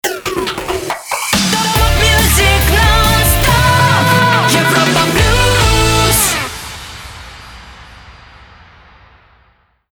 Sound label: Jingle; Europa Plus; Shotgun